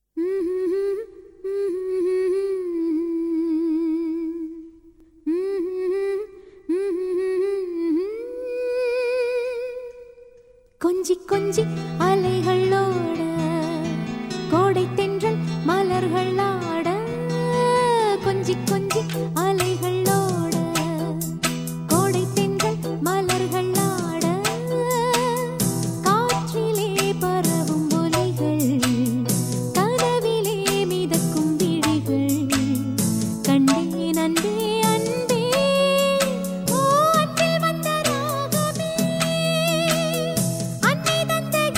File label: Female